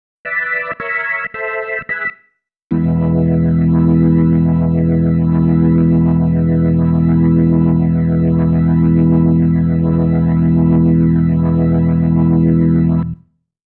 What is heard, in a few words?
Ending, Organ